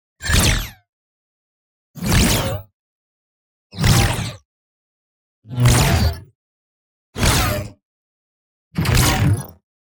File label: NEON ZAP Speedy Ion Arc; boom library